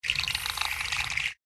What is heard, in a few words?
Scolopendra STUN